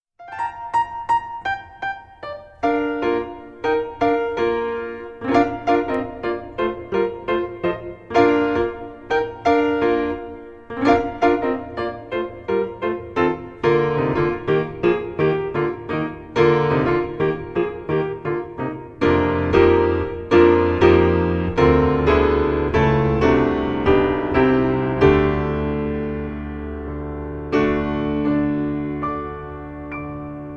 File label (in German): vaterunser instrumental